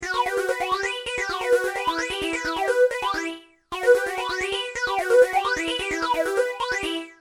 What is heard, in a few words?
fast, stage phaser; x5dr